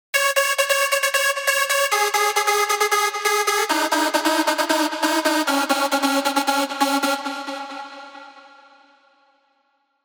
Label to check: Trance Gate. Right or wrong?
Right